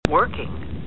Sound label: working